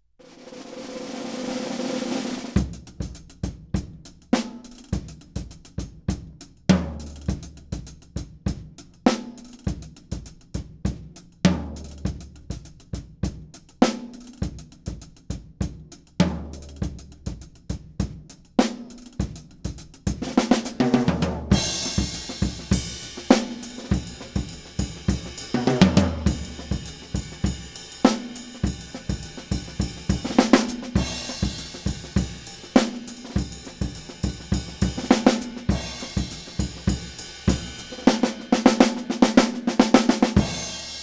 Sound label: Percussion Imaging Test, Drum, 3ft, Dry Studio